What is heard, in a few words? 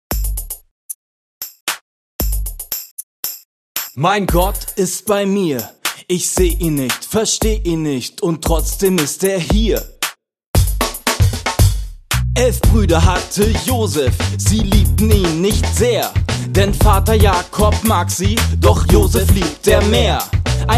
rap